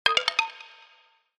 Chamber Notification; HTC; Hollow

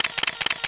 Multi Shutter; LG GB255g; Event sounds

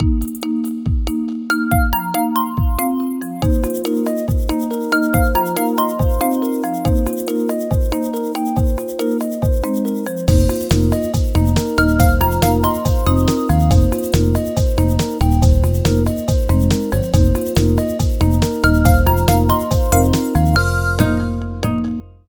Footfall

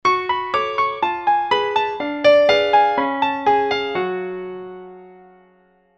Melancholy Ringtone, Classical; HTC

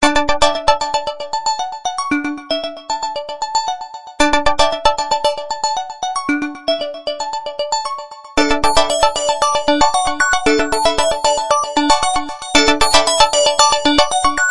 Space Ring; Pantech P2030-Breeze-3

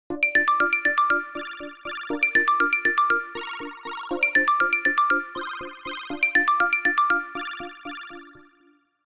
Beep

